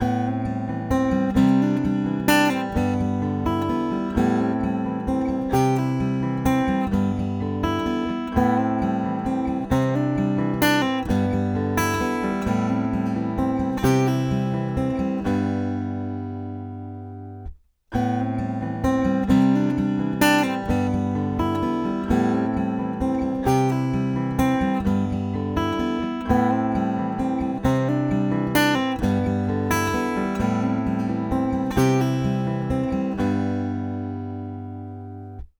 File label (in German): looper picking aufnahme und loop